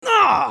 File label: pain sharp, hurt; hl2dm; scout